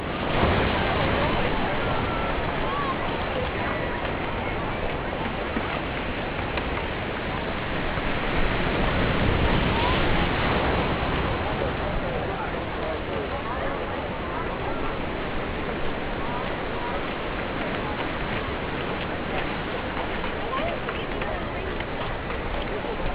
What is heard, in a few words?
Amb Caribbean Layer; Street Cricket Champions; PSP